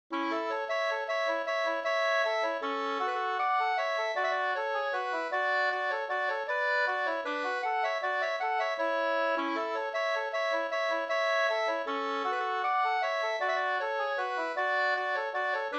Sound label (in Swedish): vals Brudvals